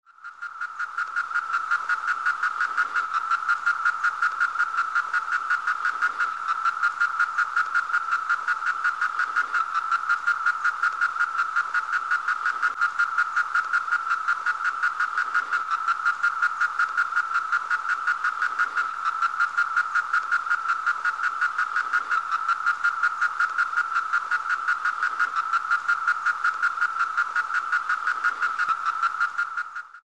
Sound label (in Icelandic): söngtifur cicadas